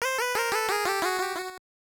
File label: Failed; Kelly Club Clubhouse Fun; gbc